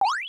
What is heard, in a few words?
shoot bubble; Bubble Bobble Remake